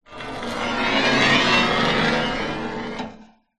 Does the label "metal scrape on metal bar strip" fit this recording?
Yes